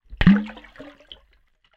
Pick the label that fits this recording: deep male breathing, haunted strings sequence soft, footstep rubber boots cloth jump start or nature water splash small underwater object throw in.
nature water splash small underwater object throw in